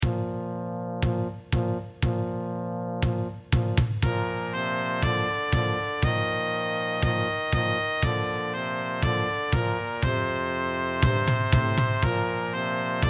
trumpet trombone

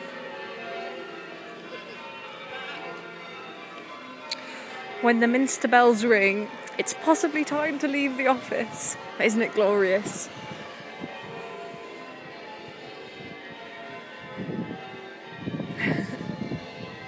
ding dong merrily on high

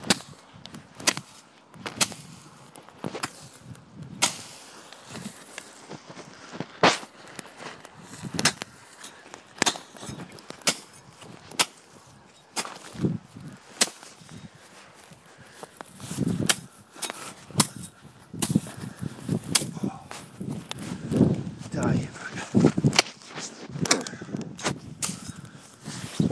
scotch thistle whacking; great stress release

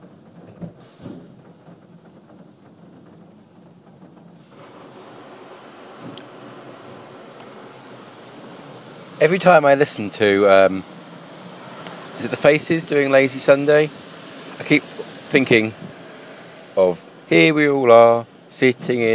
carwash

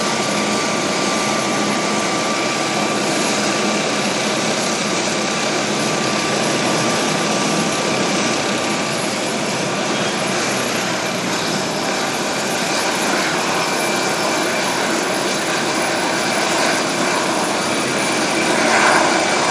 helicopter take off at Trafalgar Square

